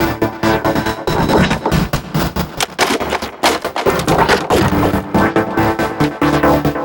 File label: Envelope Beat; Drums & Drones